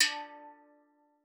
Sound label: STEELDRUM D3; Xsample VOL-4